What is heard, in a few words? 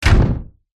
human body slam against surface